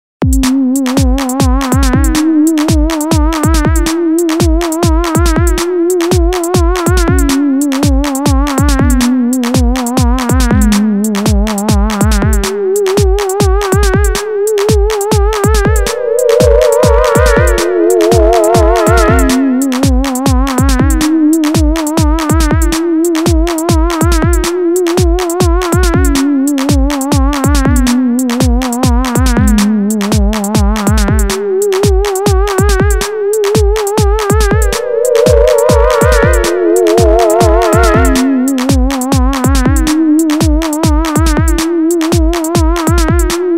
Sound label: SCARY